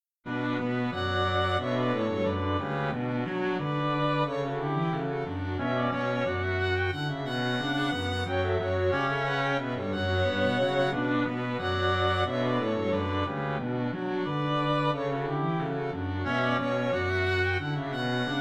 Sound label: String Quartet Stream